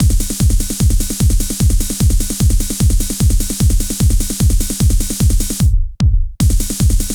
techno; INIS; Funky Xtreams

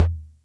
Music Percussion Computer Tom, Drum Machines

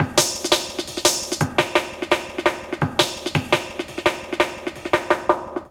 LOOP, Jungle; USB Soundscan vol.05 - Explosive Jungle; AKAI

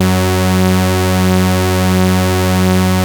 MOOG PRO, BASSES

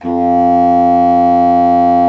BASSOON F2; Xsample VOL-6; C BASSOON

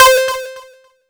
C4, Synth Line; 90 s Sample CDs; NiceBeats; SynthLine VOL-1

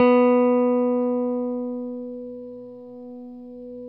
STRATOCASTR

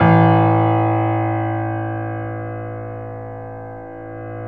PIA GRS, PIA_GRAND, Roland; Sound & Vision; Gigapack I CD 2; PIA Grand 4

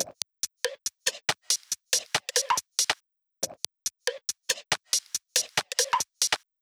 drum Loop 140BPM Percussion Loop; Vengeance - Essential Clubsounds Vol.5; VEC5 Drumloops; VEC5 Multi Loops 140BPM

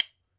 B. BONES, Ireland, Old World Instruments; E-MU Producer Series Vol. 7 – Old World Instruments

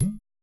perc, Roland MC-909

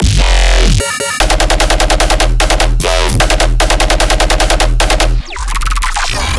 bass loop chuggy 150 E; Virtual Riot

